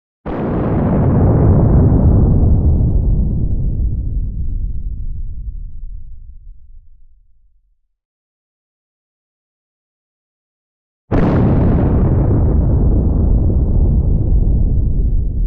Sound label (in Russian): YAdernaya bomba atomnyi vzryv